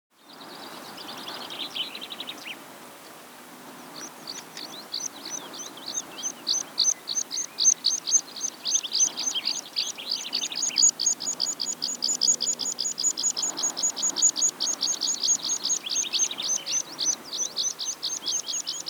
Meadow Pipit

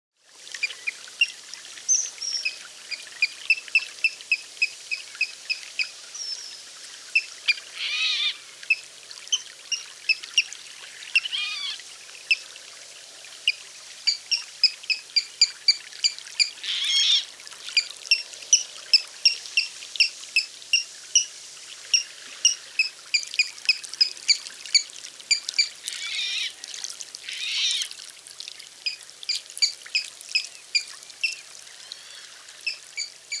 Water Rail

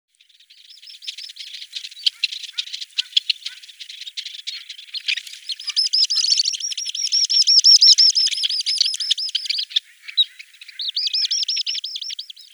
Little Stint

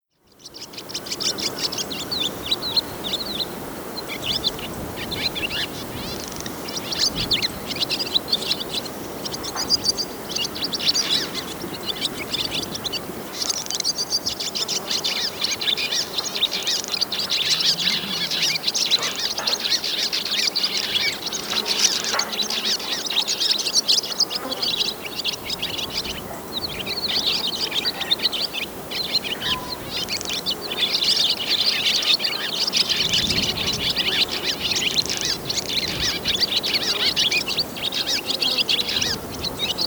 Swallow, birdsong

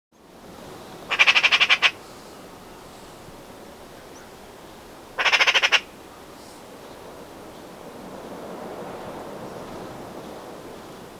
Magpie, birdsong

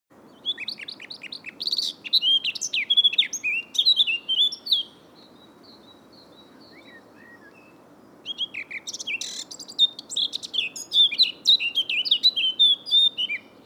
Blackcap

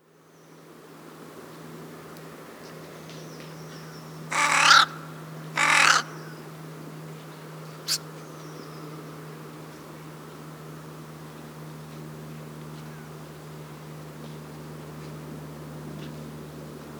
Crow, birdsong

